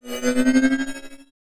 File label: ball gong charge